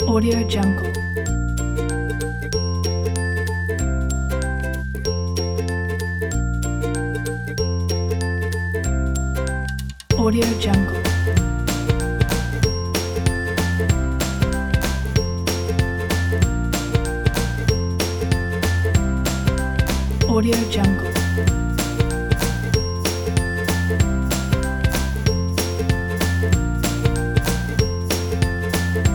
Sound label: fun; perception-music